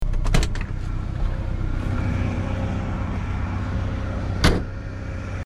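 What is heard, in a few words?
jyga dveri, Zhyguli Lada car door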